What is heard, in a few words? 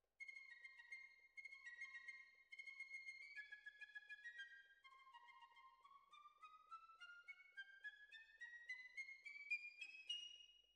Violas, jazz for piano